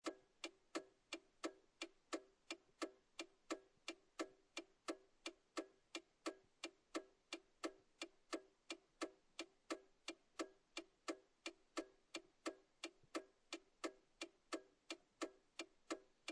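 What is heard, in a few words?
Car Interior Hazard Lights On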